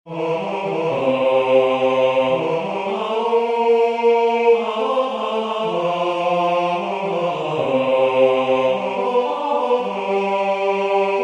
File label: Three-way Canon Blues men